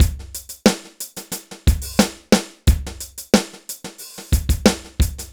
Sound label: BPM 90 DRY; AKAI S-Series CD-ROM Sound Library VOL-4